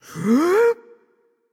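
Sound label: nonverbal breath; voicepack; smite; merlin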